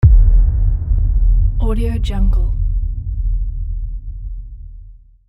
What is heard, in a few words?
cinematic low hit; starmusicproduction